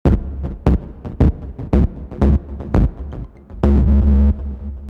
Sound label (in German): Klingelton, modular synthesizer; Superbooth22